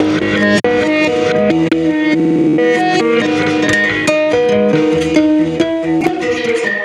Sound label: guitar